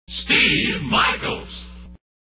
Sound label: jingles shout; positron; steve michaels